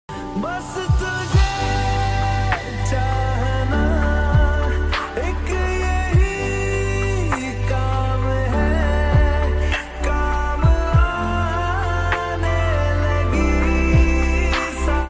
song ringtone lofi ringtone; love vibes

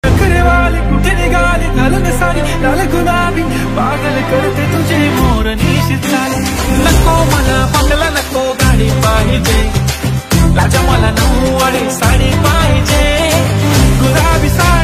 ringtone song, Marathi

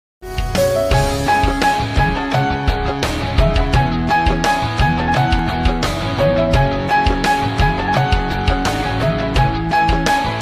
motivational ringtone

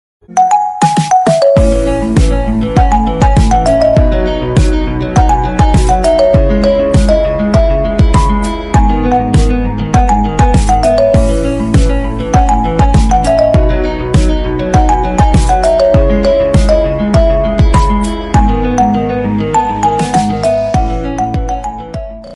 instrumental bgm ringtone

